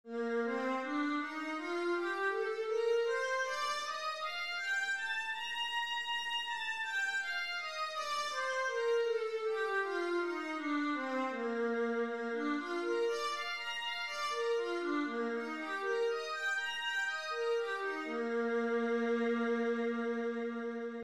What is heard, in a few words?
viola b flat major, scales